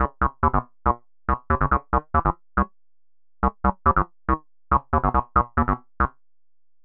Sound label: 140 Cm bass, bass loops, trance; Trance Explosion Vol1